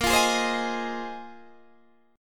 A sharp 9th Suspended 4th A sharp, Guitar, Open D

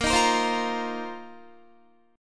Bb Suspended 2nd Suspended 4th, Guitar; Bbsus2sus4 strummed

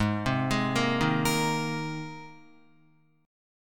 Ab Major Add 9th Ab down Guitar; Abadd9 arpeggiated; JGuitar

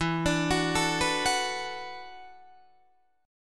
E 7th Suspended 2nd Suspended 4th, Guitar, DADGAD

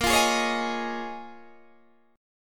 Bb Minor 11th Bb down Guitar DADGAD

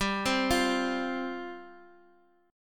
F 5th G, F5/G chord; arpeggiated

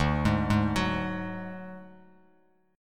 D Major Flat 5th D Bass Standard, chord; arpeggiated; JGuitar

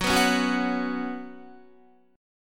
Gb Suspended 4th Gb, down, Guitar; Gbsus4 strummed

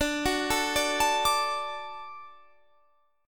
D Major D down Guitar Standard; D arpeggiated; JGuitar

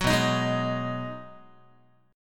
B 6th B down Guitar 4A 4E 5A 5D 5G sharp 6C sharp; strummed

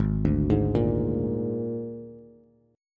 Ab Suspended 2nd Flat 5th Ab Bass; arpeggiated; JGuitar

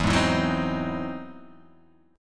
E Augmented Major 9th, Guitar, Half Step Down

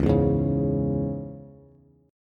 Db Minor Db down Bass; strummed

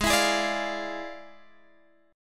E 7th Flat 9th G sharp, chord

